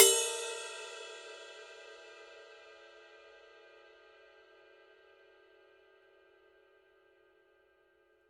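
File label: ride bell, 20, cymbal; signature precision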